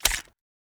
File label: magout empty, glock; weapons; Urban-Coalition; Decay; arccw ud